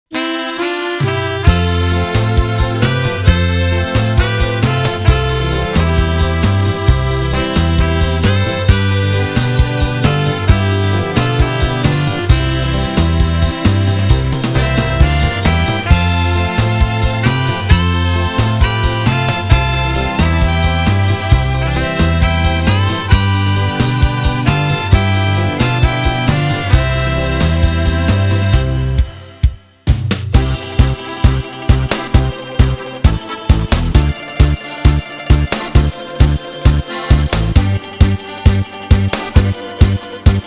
Tango Beguine

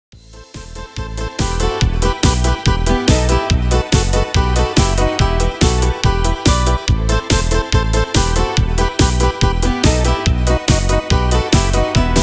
Disco

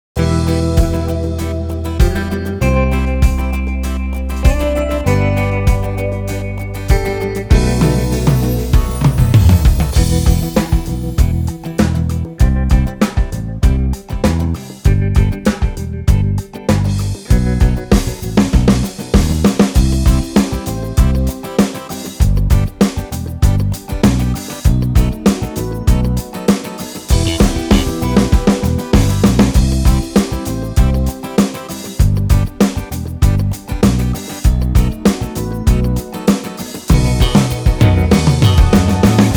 Funky Pop

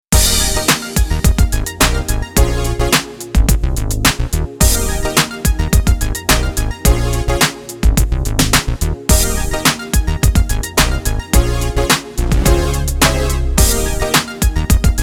Magic Groove

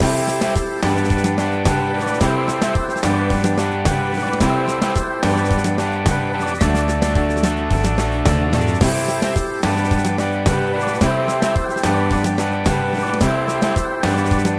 Rock

